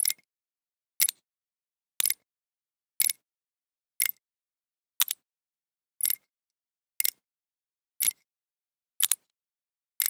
Antique Clicker, Clock, Ticking, Tick, Tock, Click, Rhythm, Time, Watch, Countdown; 344 Audio